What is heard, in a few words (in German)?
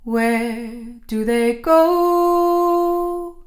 Gesang